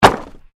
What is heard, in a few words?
Wood Movement Medium